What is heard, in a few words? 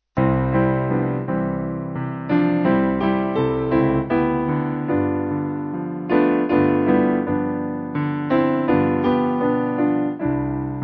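Piano, church music backing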